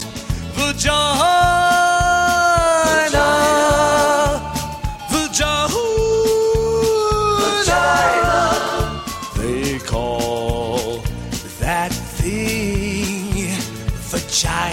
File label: Chorus; ringtone